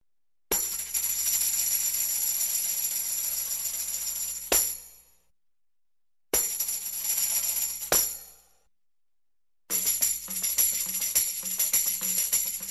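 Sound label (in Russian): звуки бубна тамбурин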